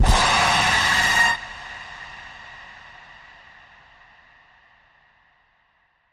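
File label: creature; Dagger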